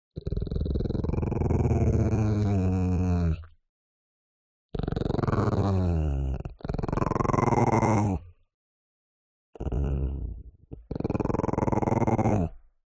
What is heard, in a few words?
DOG Chihuahua Growl Long; ANIMAL; smartsound